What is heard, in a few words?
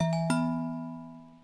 REMINDER